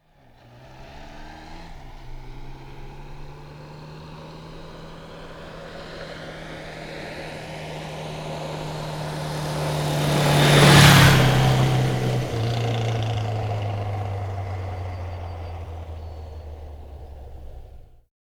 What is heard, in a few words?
Motorbike Suzuki 1100 Up and Pass Medium Fast; Motorcycle Sound Effects